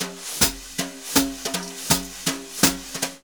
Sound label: BRUSH, Jazzistic; Univers Sons